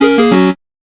Item Drop; wattsTheme

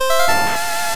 Item Drop; dewinTheme

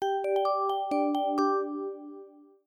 Chat

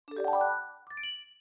Reward

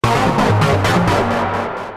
Checklist Complete; maflTheme

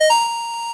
Reward; dewinTheme

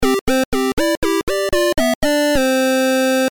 Level Up; danielTheBard

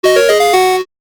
Reward; wattsTheme

